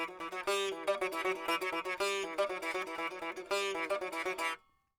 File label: sitar